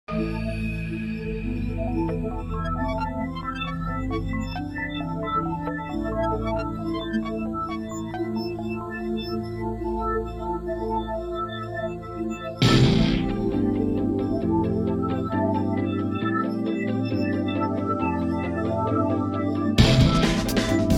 Theme of Ghost X (Instrumental; Ghost X Online

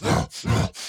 Enraged Breathing Loop; The Town; Burns

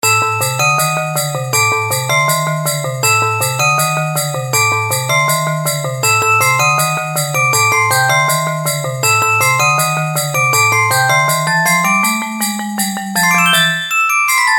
Normal Mode (Christmas); Gator Panic

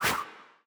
Swish; portal quest android; Paladin Skill1

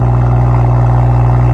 M5 Idle, car; gamerip; Car Simulator 2; android

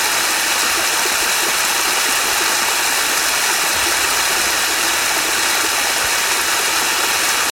water fall

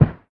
Carpet

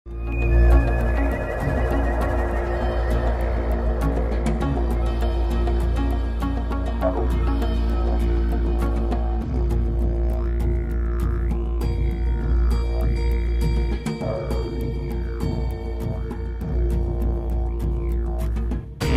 Swamp Battle Music; ARK Survival Evolved

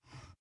close

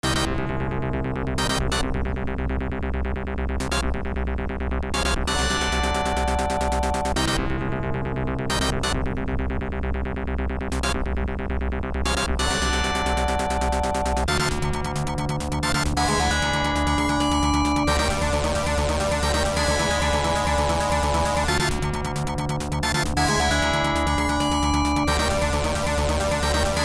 Impact (Boss BGM); Heavy Barrel; arcade

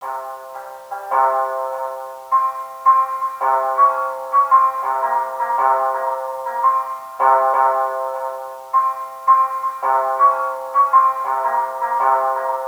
bgm shamisen; yakuza ryu ga gotoku kiwami 2 pc gamerip

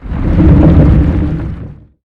Sfx Colossus Movement

